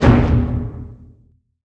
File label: Menu Horror